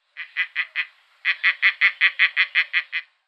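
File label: bird of prey cry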